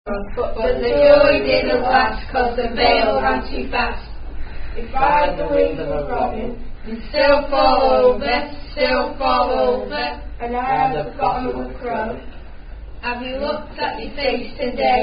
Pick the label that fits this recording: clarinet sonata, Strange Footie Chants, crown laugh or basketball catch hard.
Strange Footie Chants